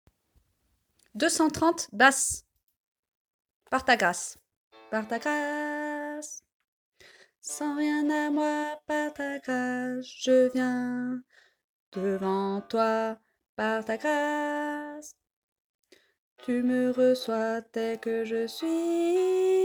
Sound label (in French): Basse